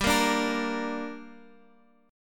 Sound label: G Minor G, Guitar, Half Step Down